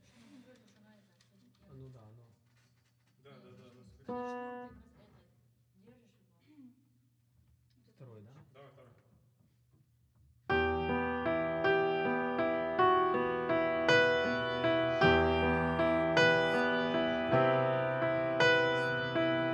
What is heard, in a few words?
piano